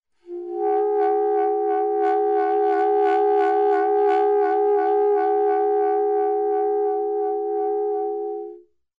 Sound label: Vibrato